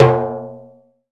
CENTER, Timbales; E-MU Producer Series Vol. 2 – More Studio Essentials; Composer; Timbales Xswitch

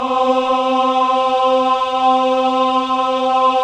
Choir of Ahs; Roland - LA Composer vol. 1

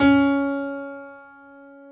PF C#3, B GRAND PF

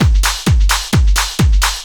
straight, Techno Trance Essentials drum loops

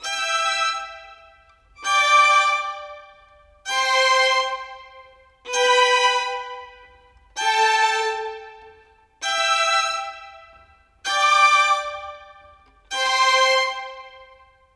Violins, Cinematic; Sonic Foundry (Sony Creative Software); Orchestral Series VOL-3; Cinematic 14

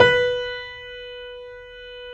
JAZZ, Keyboards Acoustic; AKAI; USB Soundscan vol.17

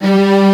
Strings B1

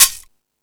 Percussion

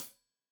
Hat Tight, Cymbals Stereo Hi-Hat; Hat Tight 1; Deeply Sampled Drums

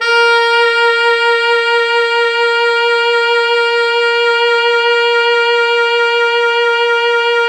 Pop Brass; Best Service ProSamples vol.19; AKAI

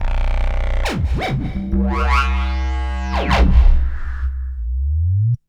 Warp Bass, Drum & Bass; Fluid Dynamics

